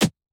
hawaiian short

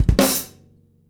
kick snare, gretsch; Dirt-Samples

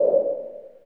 wind

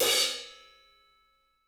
cymbal grab, gretsch; Dirt-Samples

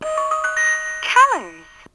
Colors, toys

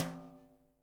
gretsch brush snare ghost; Dirt-Samples

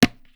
tabla; Dirt-Samples

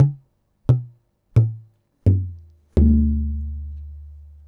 lower hits descending, tabla; Dirt-Samples

